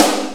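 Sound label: jet snare drum, FM drums; East West; Global Sounds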